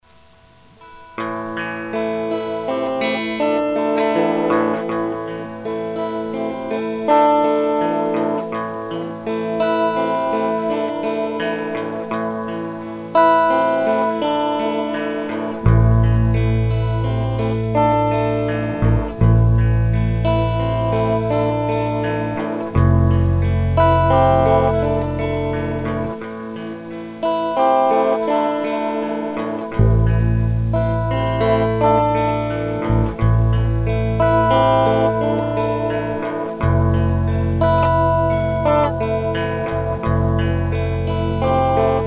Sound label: harm riff